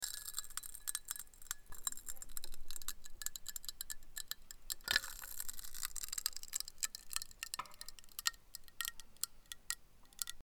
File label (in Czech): led; slysime a poslouchame